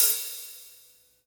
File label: HAT, Hip Hop; PlatinumPhattCD1; E-MU Producer Series Vol. 8 – Platinum Phatt